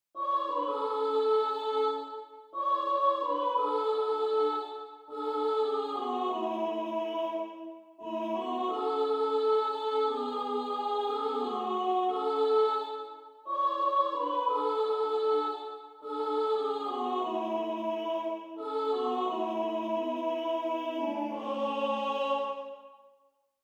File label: Treble SOPRANO with other voices audition excerpt